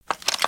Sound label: idle rattle; creatures organic